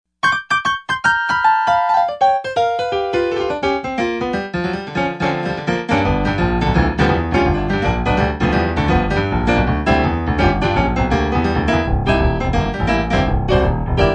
RockPiano, Yamaha TG55; Factory Presets

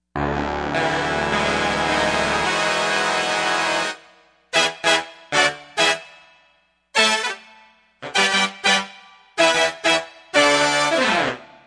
Stab Brass, Yamaha TG55; Factory Presets

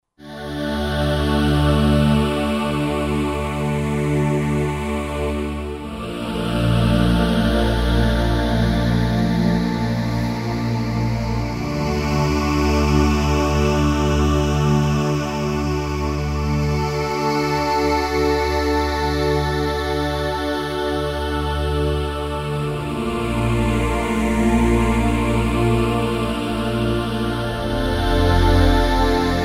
Combing Slow; Roland SR-JV80-09 Session

